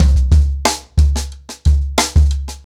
90BPM, ACOUSTIC DRUMS

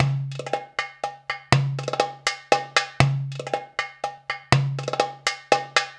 PERC LP, Arabian Traditions

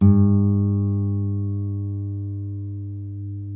NYL NV A, nylon no vib guitars; Spectrasonics; Hans Zimmer Guitars VOL-1

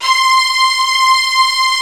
HALL STRINGS, EMU; Rubber Chicken Software Sample Bank

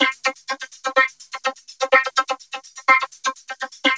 VOC LOOP, VOCODER LOOP; Zero-G Ambient 1; VOCODERLOOP1

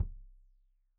Kik Stereo; Deeply Sampled Drums; SMDrum

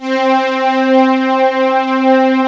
VECTOR STRIN

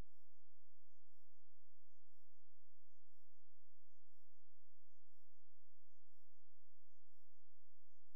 C#, Synclav FM; Big Fish Audio; SPACE1

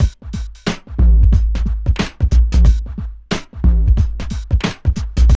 TRIP 90, trip loop

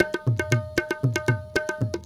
India, Heart of Asia

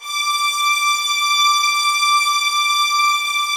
D6, soft violins; Q Up Arts; Denny Jaeger Master Violin Library

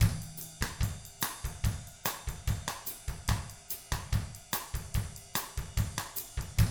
BOSSA, Drums

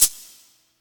Shaker, Yamaha PSR E305

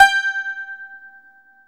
TZOURAS G 4, Mediteranean Traditions; Soundscan vol.53; AKAI

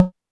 polyvox tom, Polivoks; Formanta Radio Plant

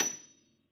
Pop Piano, F6; mp -ped; Classical Prepared Pianos; Total Piano

